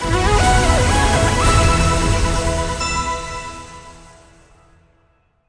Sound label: large Win; netent; starburst